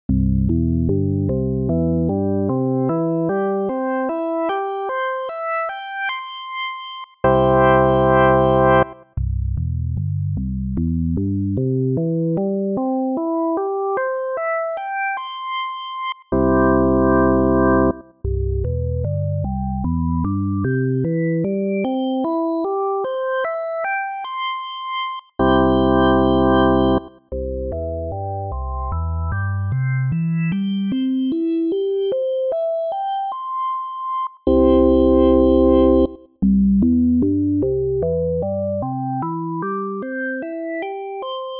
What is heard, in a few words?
hammond